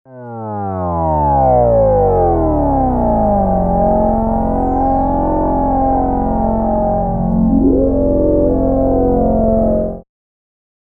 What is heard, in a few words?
Moog Modular